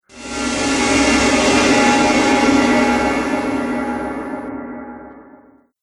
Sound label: Reverse Cymbal Whoosh